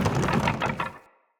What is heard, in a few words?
Cage Rattle; Avakot Ode; Level Events; Midrath